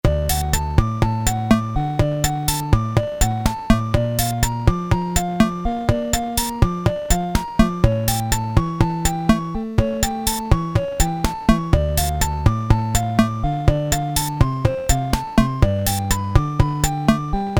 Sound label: bossa nova, Casio SK-1 rhythm